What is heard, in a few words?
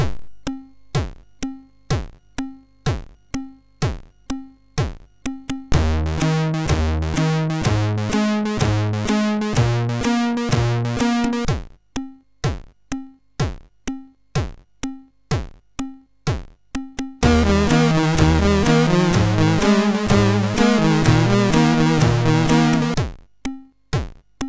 sid MOS6581R3